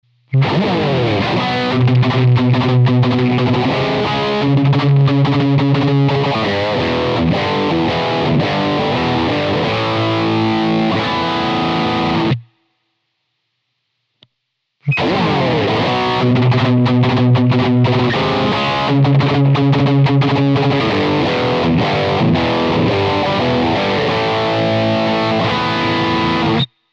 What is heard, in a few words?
High Gain; Simlpifier; Amp-AB; MR-Stack